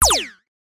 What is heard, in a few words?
leisure video game retro laser gun fire